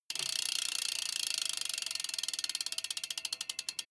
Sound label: bmx bike freewheel push and slow down short